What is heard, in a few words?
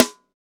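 SD, snare drum; 90 s Sample CDs; Sound & Vision - Gigapack I CD 1 (Roland); Real-Kit m 4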